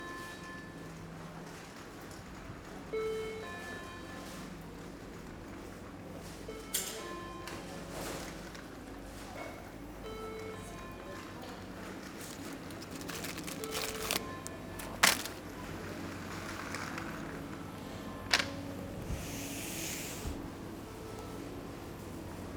ruch

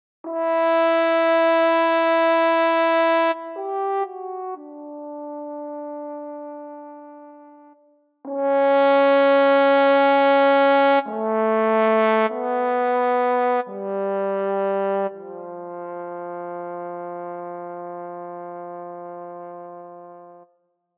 CORNO s vibratem

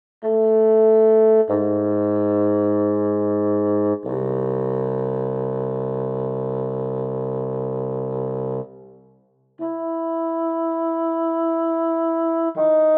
FAGOTTO s vibratem

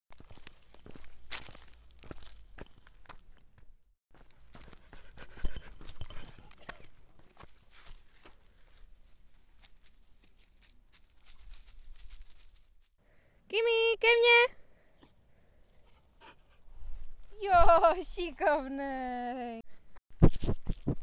reportážní situace

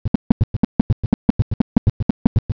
galop

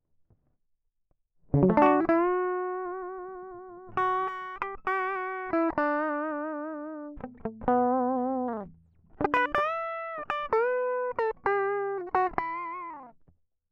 Kyt Solo

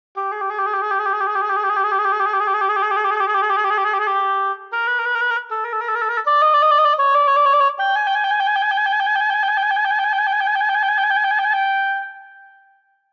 OBOE trylky

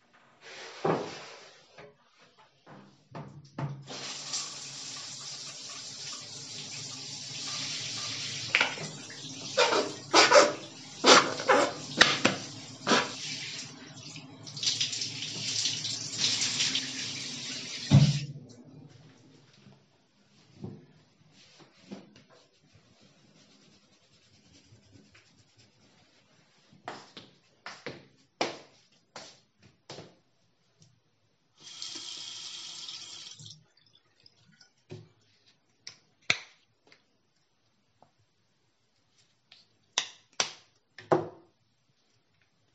Ruch

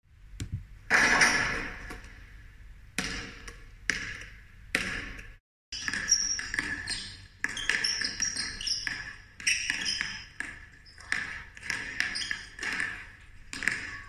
Situace

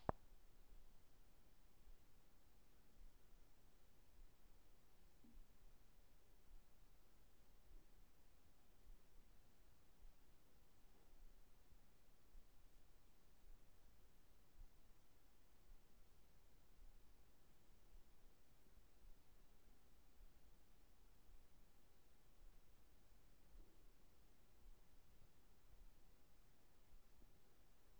Olympus LS-100 interni sum

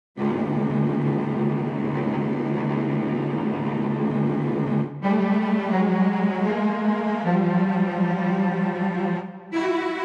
trylky

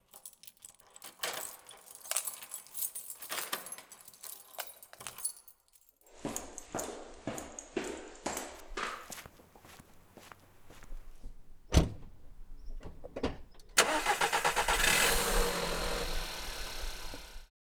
ruch

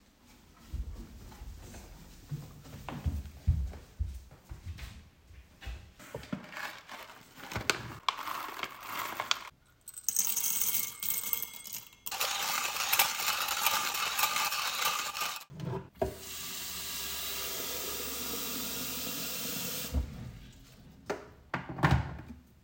ruch

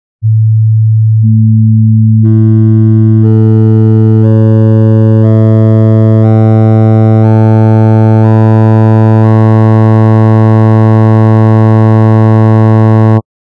harmonicka rada v souzvuku

